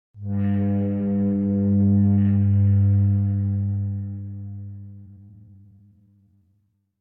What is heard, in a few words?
Bass Horn Reminder - Higher, Single; Hollywood Edge; sound designer's toolkit v2